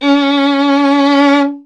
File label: STR VLN, Vln Detache; Miroslav Vitous